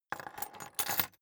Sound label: key ring large place table soft